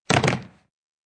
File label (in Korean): Fights, 싸움-전투; 헐리웃특수효과음